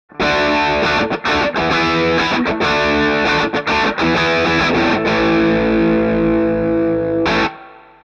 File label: Fig8 Crunch Chords